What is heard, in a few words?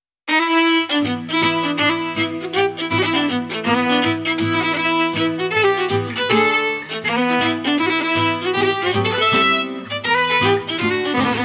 Trad, celtic fiddle; red room audio; traveler series; kontakt